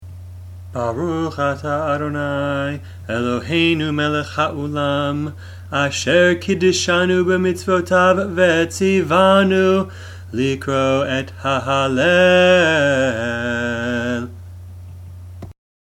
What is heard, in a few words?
Hallel Opening Blessing